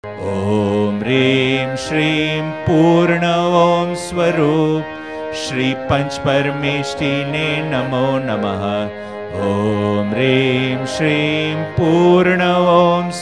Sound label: Om Hrim Shrim Poorna Om Swarup; paryushan bhakti; paryushan bhakti 2016; devotion collection